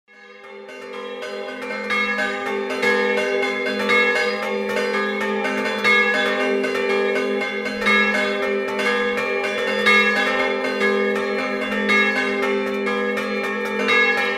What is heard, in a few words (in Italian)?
scampanio cludinico, campane